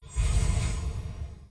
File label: stand drop; tf2classic